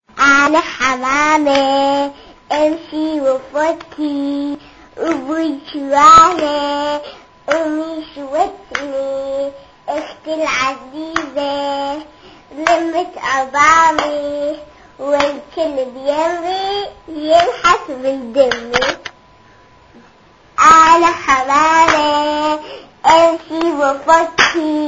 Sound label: pigeon song